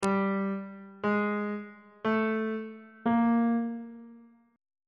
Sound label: d double flat dim6 min6 maj6 aug6 intervals on bass clef